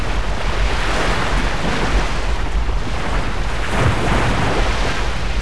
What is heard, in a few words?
COAST; tapache